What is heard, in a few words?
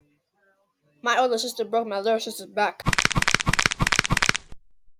bone crackers